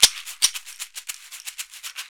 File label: Caxixi Beats; Roland LCDP14 Africa VOL-2